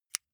lighter electric release

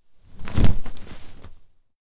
clothes low hit drop

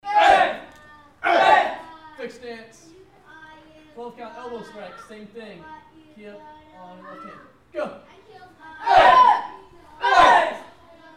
karate multiple blocks

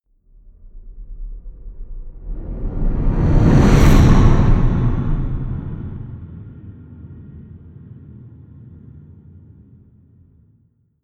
whoosh race

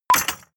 medieval click light positive

